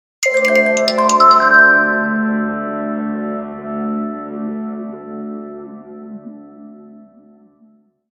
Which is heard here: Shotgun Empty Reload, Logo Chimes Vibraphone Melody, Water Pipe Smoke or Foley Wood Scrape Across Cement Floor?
Logo Chimes Vibraphone Melody